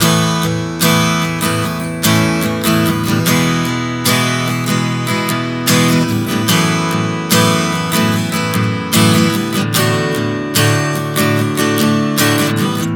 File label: Progression 074 Asus9-E-F#m11-D, Acoustic Guitar, Chord Progressions 074 bpm; Sonic Foundry; Songwriter Acoustic Guitar Companion